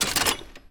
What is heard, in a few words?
gun reload; World of Tanks